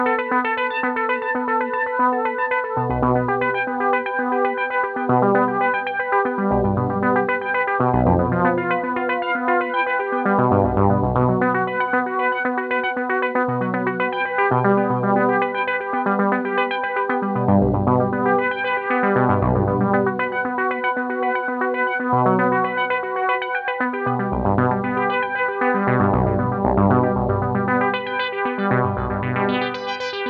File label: Jazz